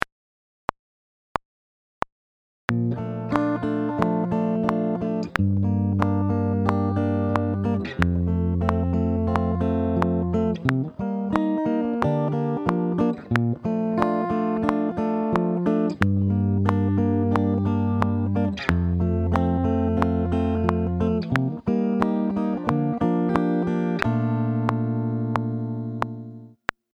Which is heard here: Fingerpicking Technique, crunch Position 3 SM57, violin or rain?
Fingerpicking Technique